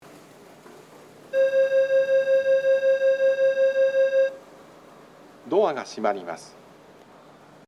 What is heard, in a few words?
melody; myogadani